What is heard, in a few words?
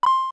Shield; Shield deflecting an object